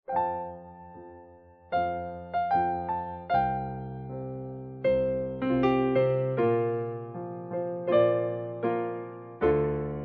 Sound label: piano